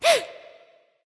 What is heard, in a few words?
gasp; smite; aphrodite